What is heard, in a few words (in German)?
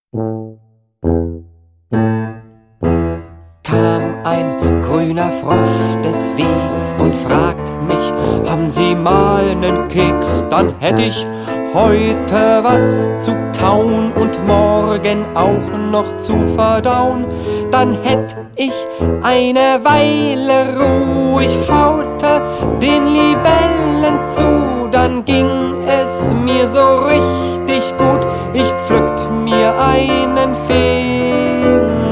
Lied vom Frosch